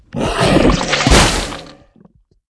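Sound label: larva brown attack, monster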